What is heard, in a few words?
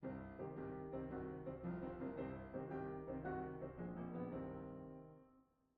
Piano